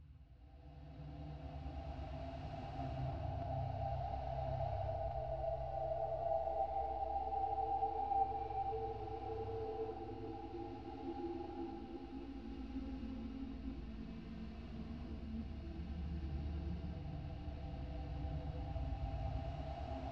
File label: Garden ambience; Gonner